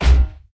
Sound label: Footsteps; SFX Character